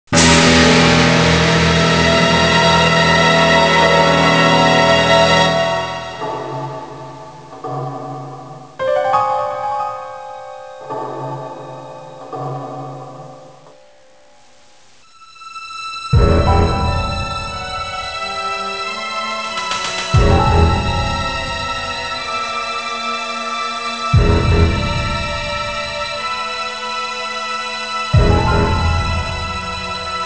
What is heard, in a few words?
scary; svencoop